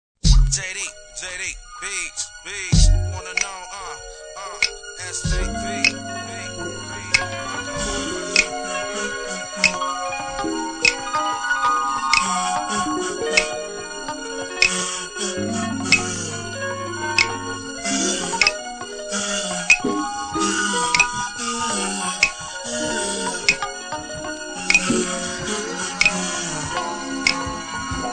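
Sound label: Brazilian groove instrumental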